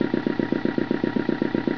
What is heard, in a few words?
prop